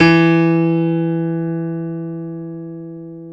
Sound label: PNO E; Back In Time Records; Korg Universe VOL-1